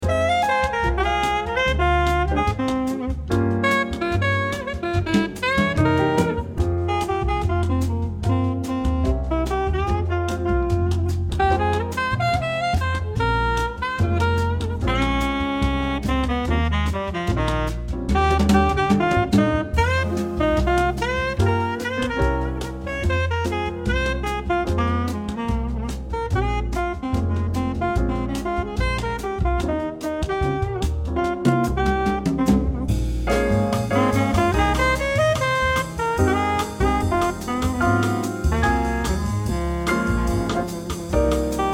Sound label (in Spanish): Musica Jazz Instrumental - Bossa Jazz